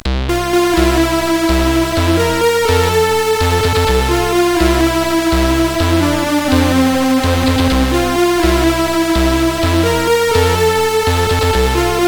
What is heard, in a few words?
Jingle 2 Breakdown, sid CSG8580R5